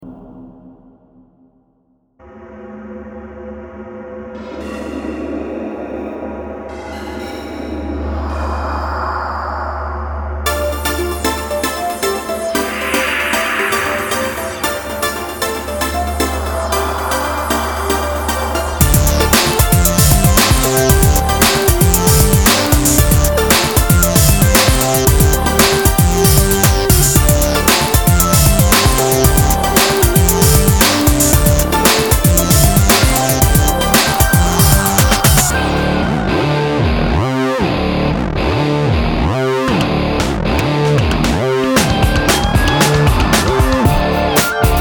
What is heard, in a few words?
Video Moog